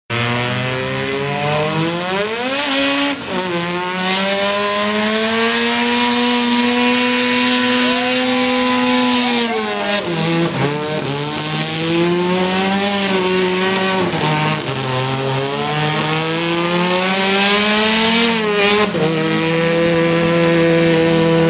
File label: rotary datsun rx3